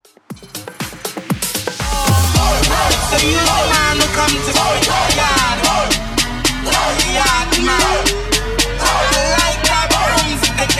120 115 Bpm; Transition; ReDrum; Mash up

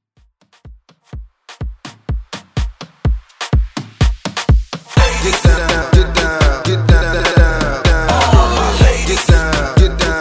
Afro Reffixx

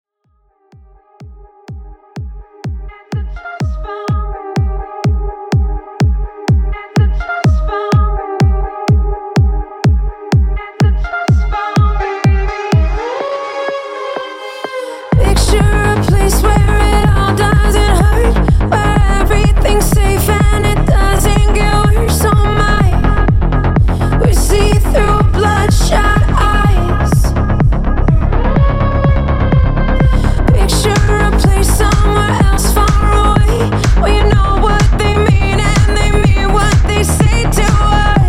Club Re Drum 125Bpm

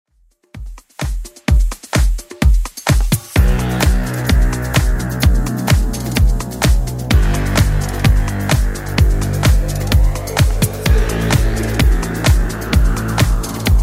80s Club 128 bpm CLEAN; 80s Club Edit

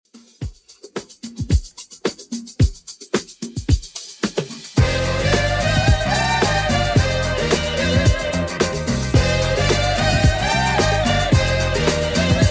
70s Redrum 110 bpm

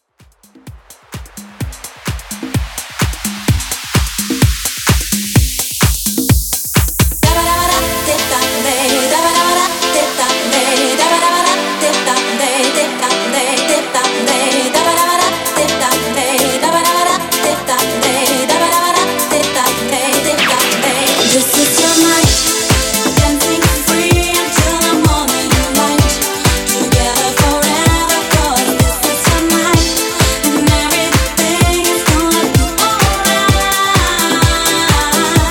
Re Drum 128Bpm

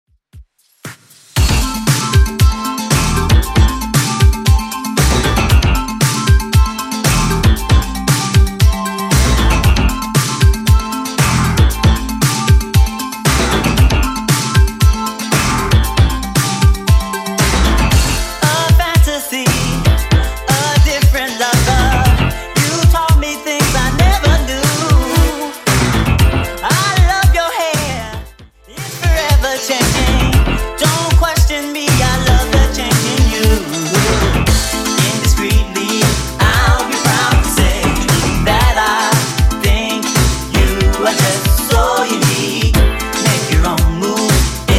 80s Redrum 116bpm

